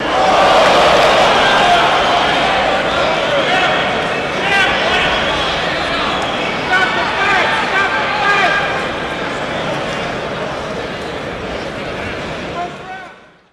Crowd Yooo High Pitch, big arena

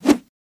Rod Metal Med By, spears & staffs

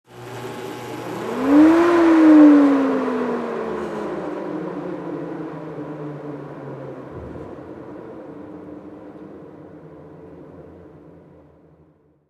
Industrial Silo Fan

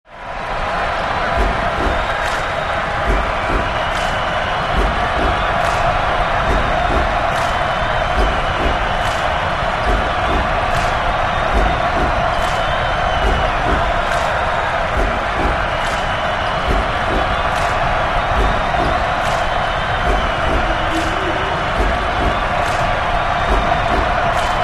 Basketball Huge Cro, sports arena

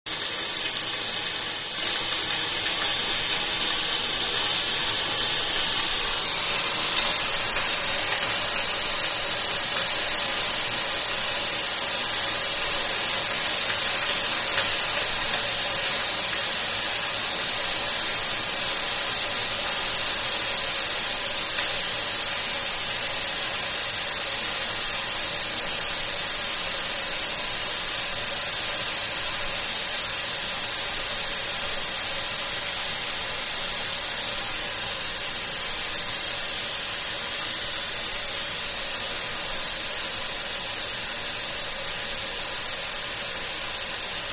Air Blast Large Hiss

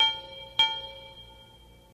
Metallic Hit Clink, anvils

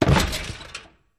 Objects Fall Various

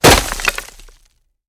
Axe Chops Wood Heavy

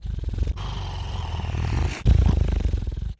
monster growl, breathing

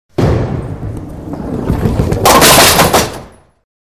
Bowling Ball Rolls

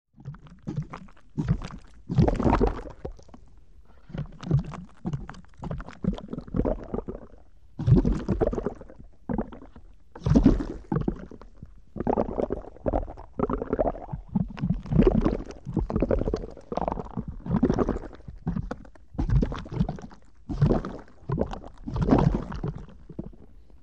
Big Underwater Bubbl; bubbles

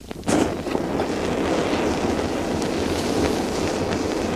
Benzine Flames Idl, small

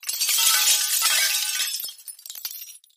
Glass Debris

